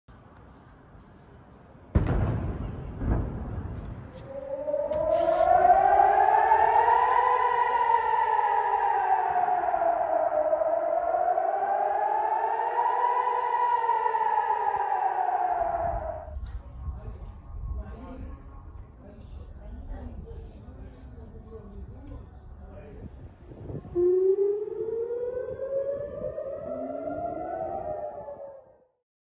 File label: sirens, آژیر خطر جنگ; Iran